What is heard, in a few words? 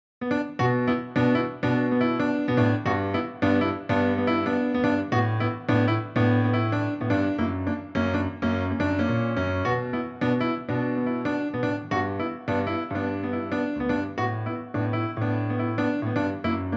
Grand